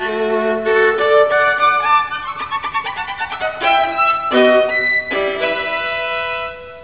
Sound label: violin